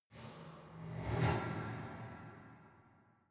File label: metallic impacts